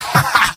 laugh short; scout; mvm

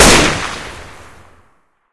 rifle fire incendiary; weapons; rifle ak47; gunfire; source engine; left4dead2

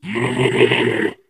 ballbot jump

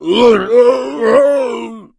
boomer shoved

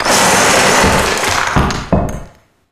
linear debris; Source Engine; Portal 2

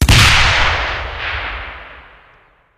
grenadier explode, weapons; tf

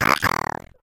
uh oh; player

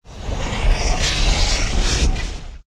hell rumbles; Source Engine; tf

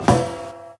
metal grate, footsteps

